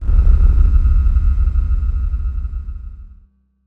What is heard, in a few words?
altar sound